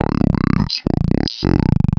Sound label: Vox, Circuitbend; 99Sounds; Mangling Audio